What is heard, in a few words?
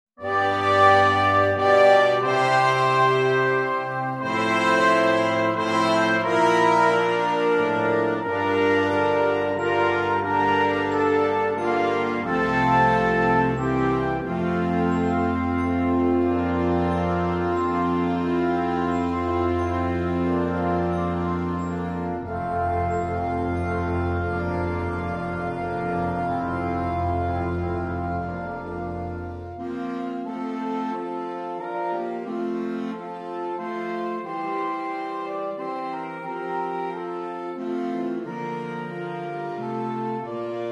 Bromley - Fanfare